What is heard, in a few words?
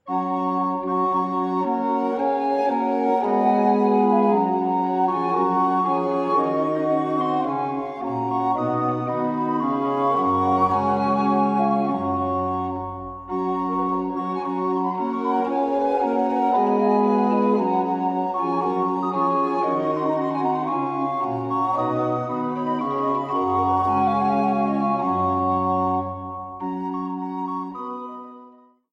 Sonata a 5 flauta; Antonio Bertali